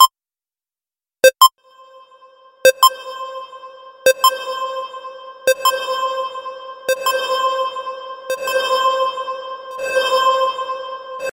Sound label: 2 Tones Reverb